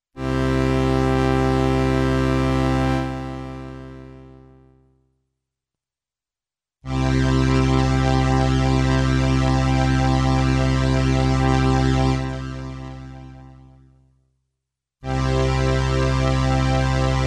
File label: STRINGS I w ensemble alternatives